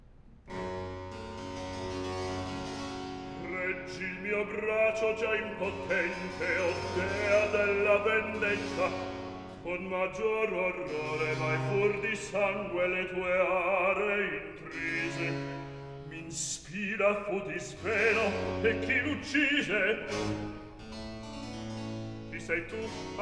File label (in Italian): Recitativo; Reggi il mio brando; Handel Masterworks; Klassika; Act III